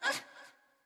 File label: Wimp Hurt